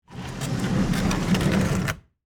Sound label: Wood Crate Move, Foley, Wood, Crate, Movement, Slide, Large, Box; BlastWave